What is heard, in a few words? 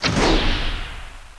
BALLISTA; Caesar III